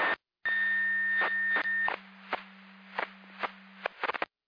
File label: Siren; Hamburg FD